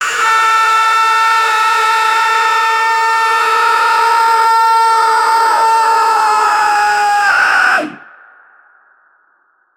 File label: angry pterodactyl scream; prehistoric